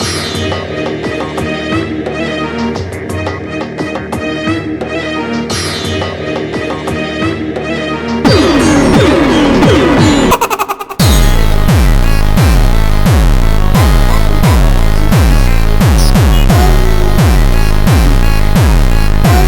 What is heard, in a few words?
Phonk Jumpstyle Super Slowed